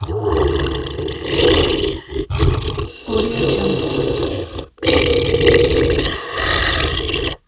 zombie dog snarls

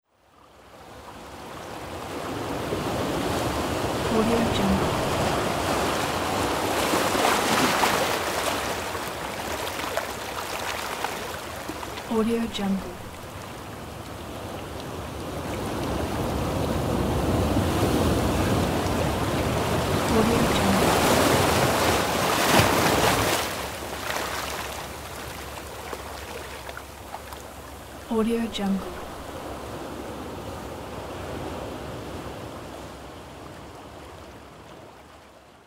sea waves

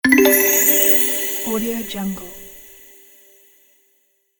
enter magic level; gamechestaudio

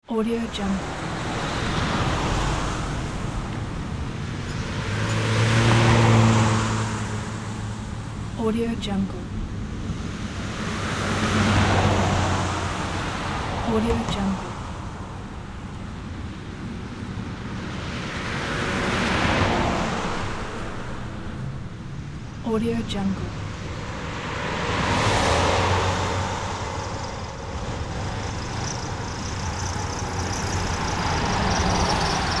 ambience street cars

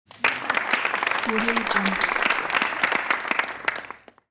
applause of the small crowd

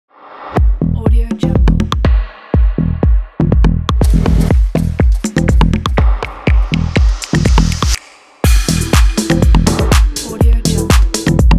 minimal house fashion logo

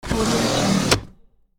opening drawer

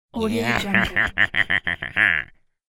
tricky gnome angry laugh

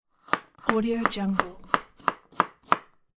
fast chopping

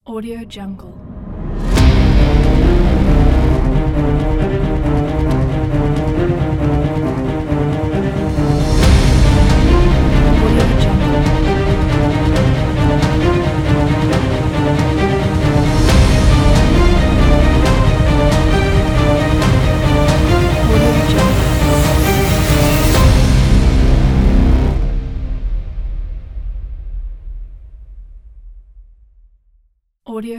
epic ident pack

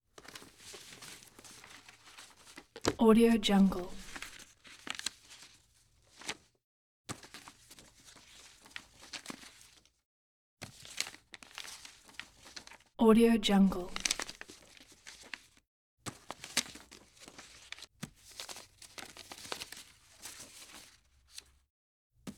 scrapbook placing on table turning pages

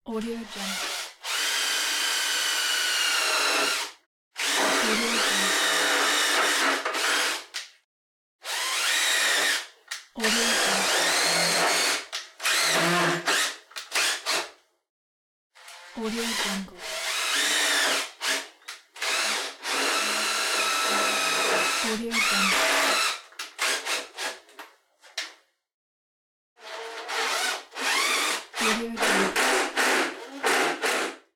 electric screwdriver

